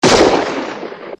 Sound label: gunshot